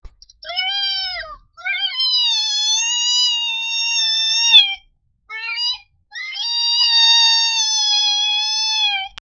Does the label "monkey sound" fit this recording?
Yes